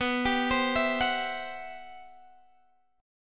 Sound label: C-Major Sharp 11th B, guitar